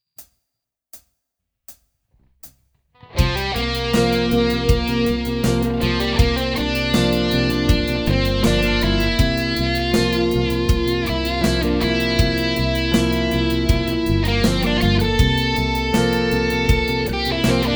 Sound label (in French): do mixolydien improvisation